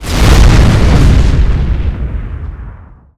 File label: turbidity air exp